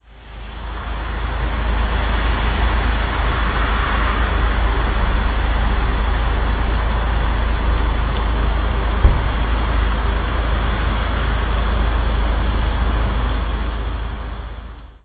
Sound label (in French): pluie baltimore